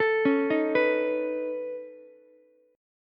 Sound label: C sharp 7th Suspended 2nd Sharp 5th, C sharp, down, Ukulele; arpeggiated; chord